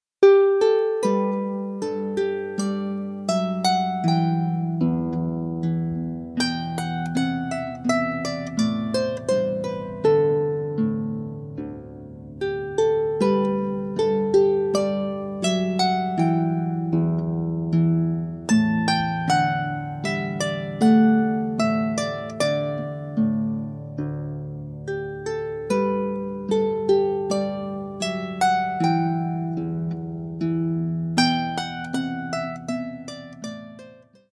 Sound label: Gavotte, Harp